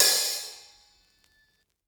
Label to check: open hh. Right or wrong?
Right